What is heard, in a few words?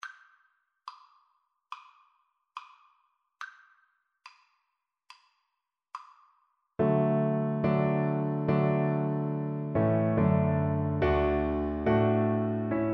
DB, Double Bass